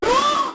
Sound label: scream; radio susan